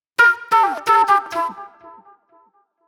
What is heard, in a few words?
Sharp Delay Flute